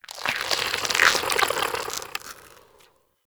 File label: Plant Smoke Stack Creak; Ambience; Props; Warframe; Venus Open World; Venus Landscape